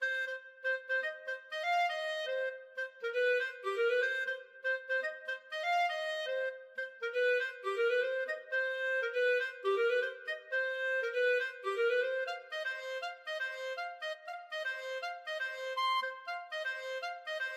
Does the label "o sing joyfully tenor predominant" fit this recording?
No